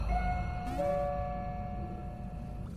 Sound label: elevator; dandys world